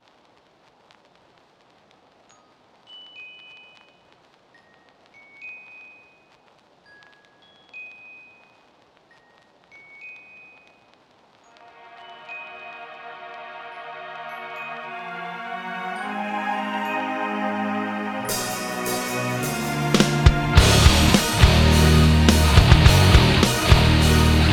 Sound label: Euro-Metal Cover, Instrumental